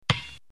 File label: basketball bounce